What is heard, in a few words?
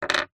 foley stone small down on wood; zapsplat